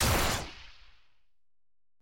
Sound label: paah; pyke